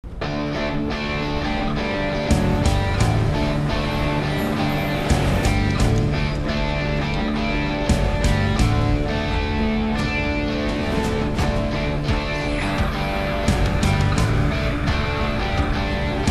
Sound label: Intermission Guitar BGM; Kaatru Veliyidai; Tamil